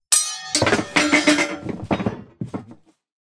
warfare sword drop wooden floor; zapsplat

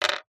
foley stone small down on wood; zapsplat

